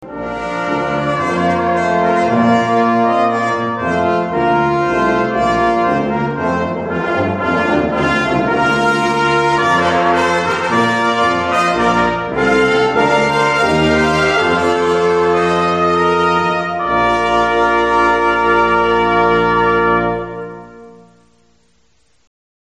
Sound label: Trumpet Voluntary